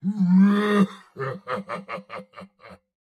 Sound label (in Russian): Смех; Турбосвин